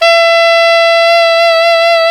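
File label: saxophone alto mezzo piano; Roland - LA Composer vol. 1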